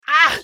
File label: usec wound; eft; scales